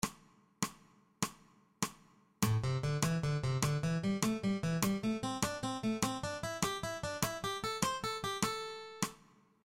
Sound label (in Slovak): osminove trioly vzostupny motiv tlmenie zapastim; cvicenie